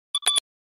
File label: low battery, phone